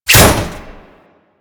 Radial Blast Impact Metal; Warframe; Excalibur

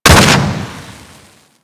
Pulse Exp Impact Default; Warframe

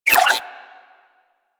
Space Port Cargo Scanned Disable Off; Venus; Objective Rooms

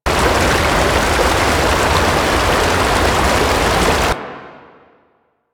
Settlement Waterfall Large; Ambience; Warframe; Grineer Settlement

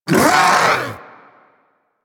Vocal Pain A; Warframe; Hunting Critters; Vulpaphyla

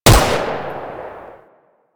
Rifle Shot; Weapons; Warframe; Corpus; Crp Ruban Rifle

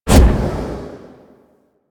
Operator Wraith Void Projection Enter; Warframe; Focus; Powers; Wraith Movement

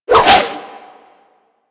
Sword And Whip Spin Whoosh; Warframe

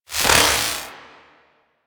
Arm Movement; Zariman Power Rift; Warframe

